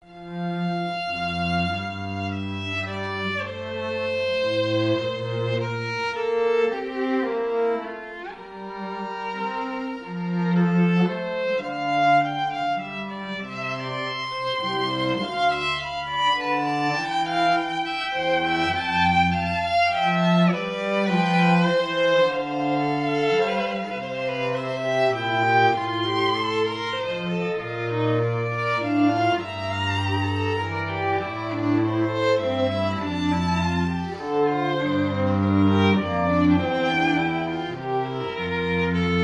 carneval strings double concerto

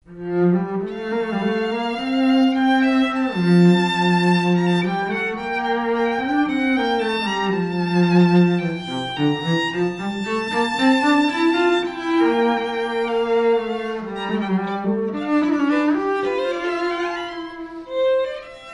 strings allegro; carneval

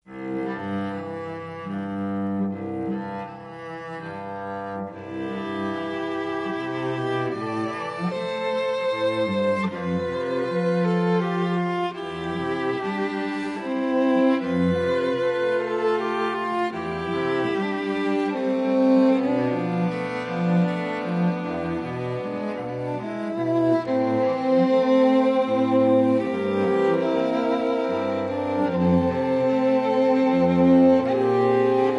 strings; carnevalstrings

